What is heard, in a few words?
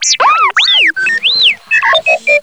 R2 Alarm; Star Wars; R2-D2